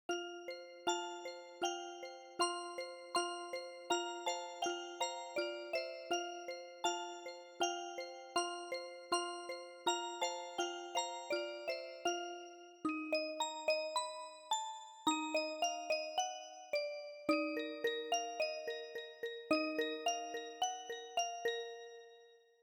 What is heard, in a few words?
Music Box; Quidam